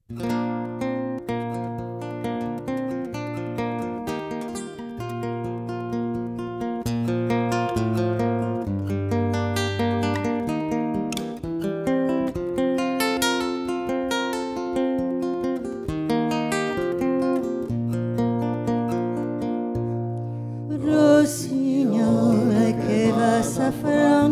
EL RUSSIGNOL 3 VOIX

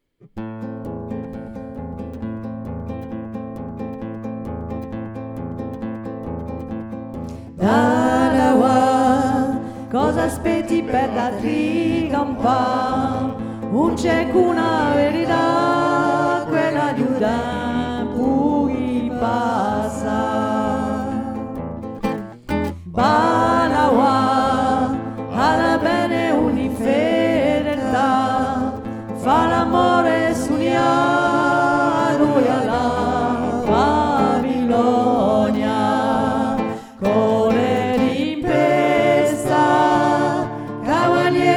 3 VOIX; repertoire de a manna

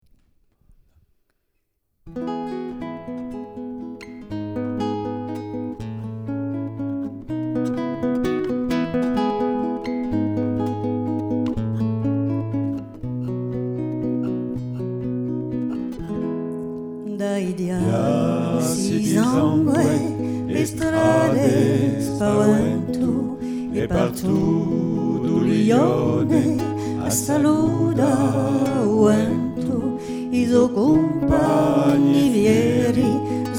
MAQUETTE 3 VOIX; repertoire de a manna

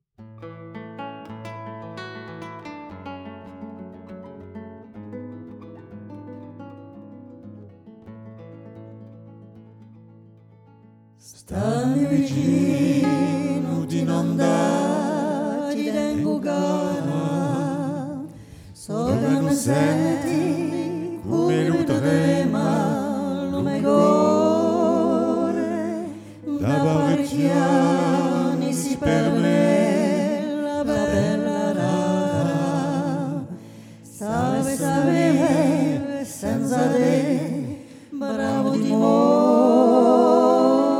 3 VOIX; repertoire de a manna

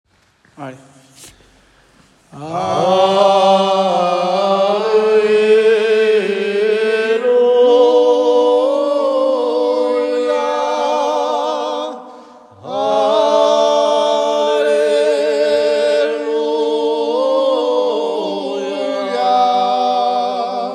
ALLELUIA 3 VOIX

